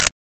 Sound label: click; NFS; Keiikos NFS Classic Archive